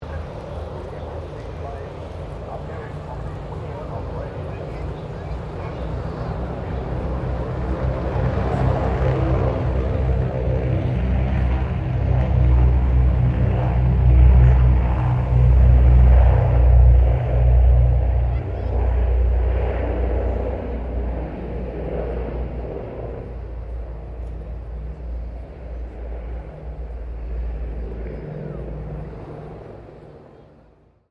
AIRCRAFT LOCKHEED L-1049 SUPER CONSELLATION TAKEOFF; Connie, Super Constellation; 1955; Airplanes; Vehicles